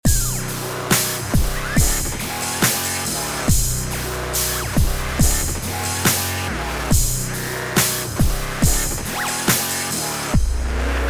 dubstep track

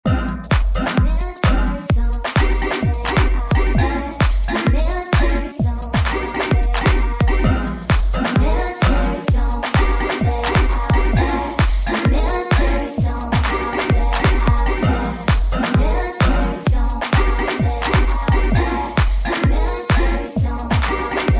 uk garage beat

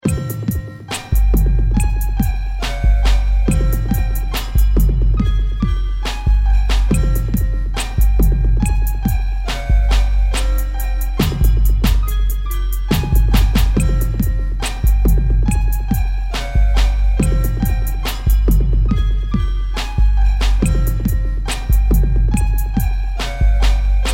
Piano

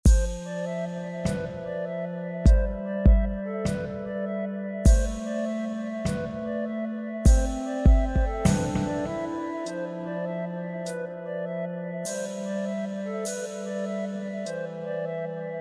wind ocarina

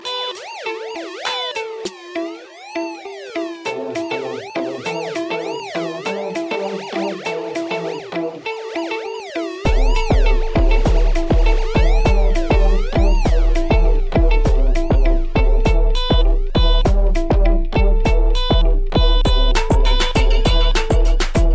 PLUCKING; MAD DECENT

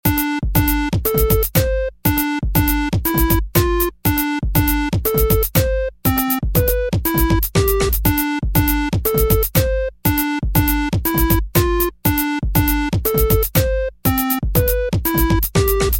EMX Percussion Test

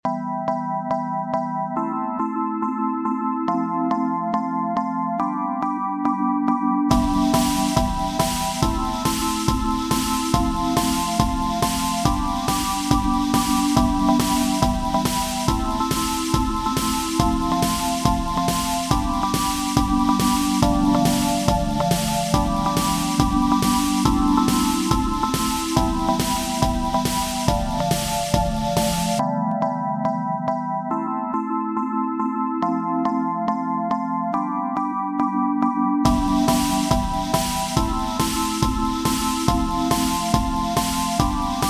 Mellow Sounds

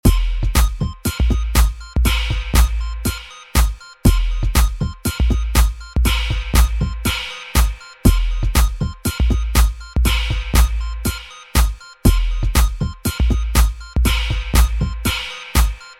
Dance beat idea

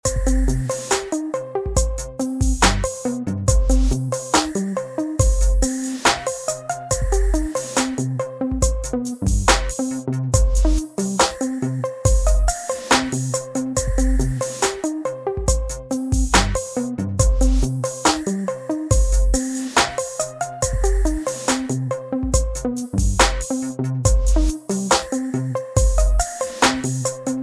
ummmmstep

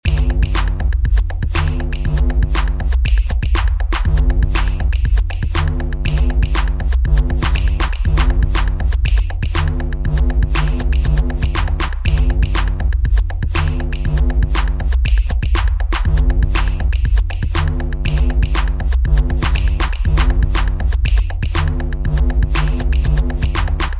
Spoons & Pots & Bass; short remix

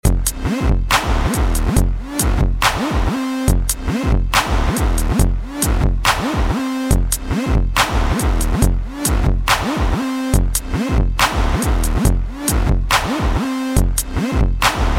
Welding beat

